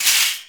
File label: Shopping Cart Hit